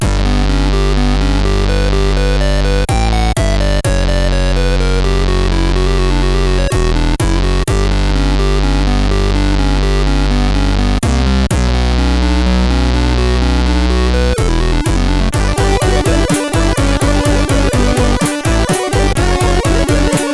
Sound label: Up-Tempo Rock Remix, CSG8580R5